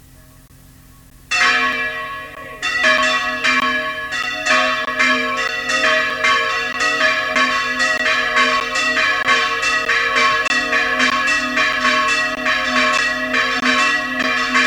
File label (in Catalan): campana